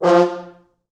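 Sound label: 4 French Horns, Horns; F2; East West; Quantum Leap Horns Sax; 4 FrHorns MOD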